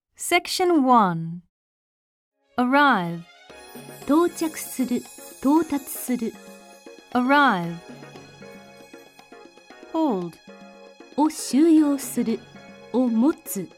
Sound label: tango